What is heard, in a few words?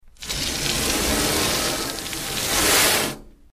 Sand Bag Dragged Scra